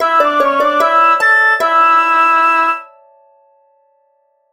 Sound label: Whistle